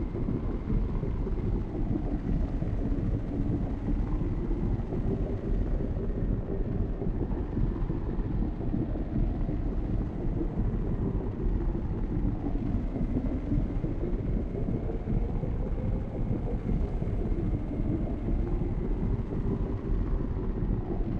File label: Amb Wind Warble Loop; viewfinder